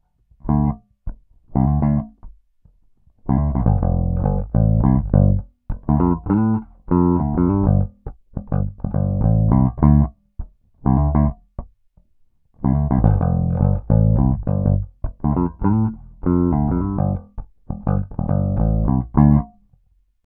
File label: Peavey Stock Bridge Pick Tone DOWN; Peavey based pickup Shootout